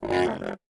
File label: Mosu Cry